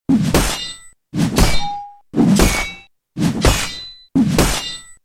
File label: attack; Meepo